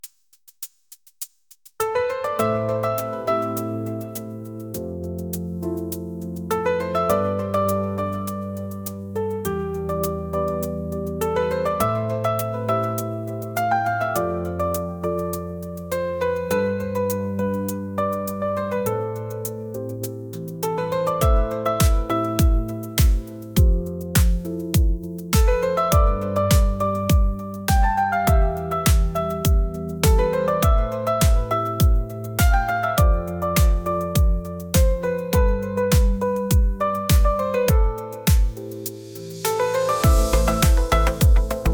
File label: celestial encounter, krasivaya muzyika